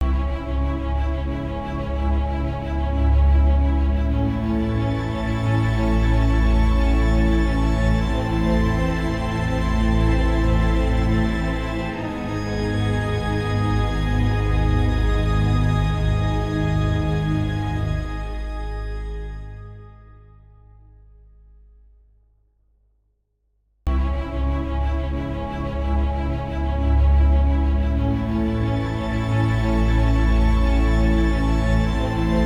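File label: Music Transition start Short; From Dust; ps3; xbox 360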